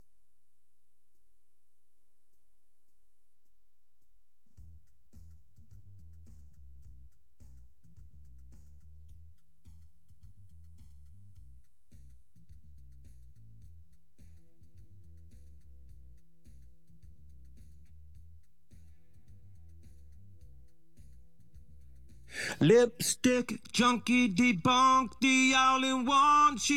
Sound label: vocals